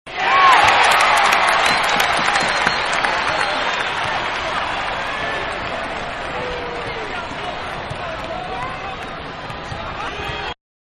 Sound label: Crowd-Disappointed; Crowds; Backgrounds; Manhattan Production Music